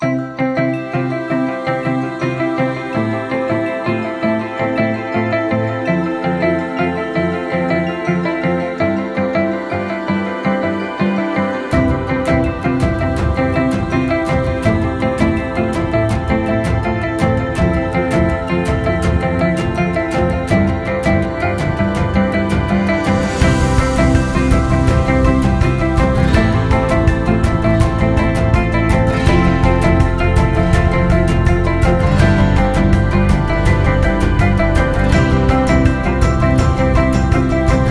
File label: Uplifting Upbeat Indie, corporate music; corporate music vol 17